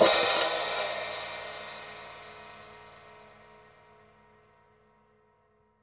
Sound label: hihat - opened; JazzFunkKit